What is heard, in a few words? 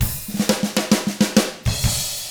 SHUFFLER, Drums